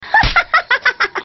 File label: laugh; marceline